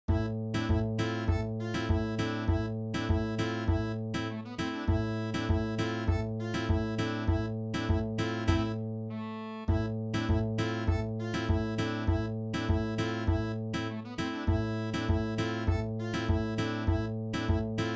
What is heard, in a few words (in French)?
bourree